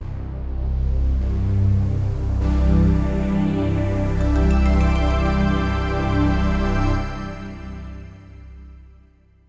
Builder Mario Goal, Builder Mario goal music

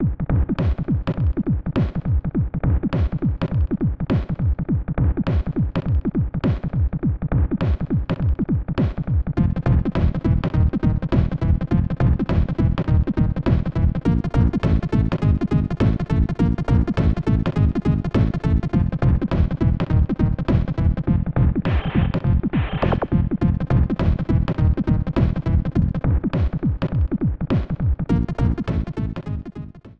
Mixed Match Music; T&DM

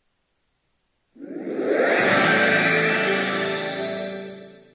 Nintendo 64DD start up

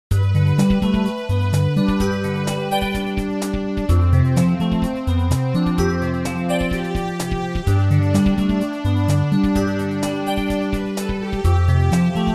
Underwater Cave; SM64; Dire Dire Docks

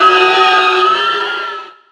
Bowser's voice clips; Brawl

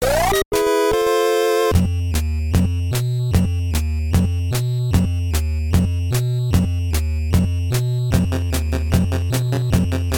Chill Clear; DM NES

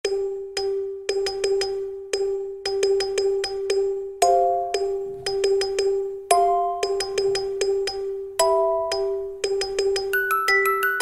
Airship Night; SMM2; NSMBU

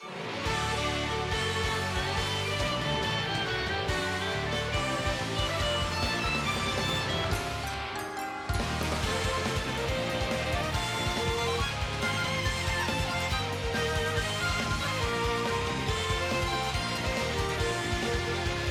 Final Battle

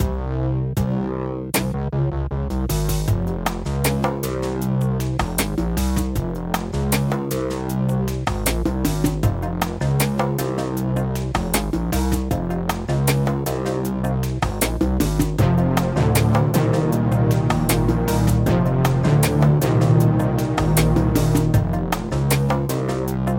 Underground; SMBW

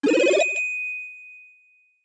Super Bell Sprout

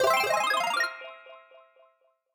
Power Up Star; SMBW

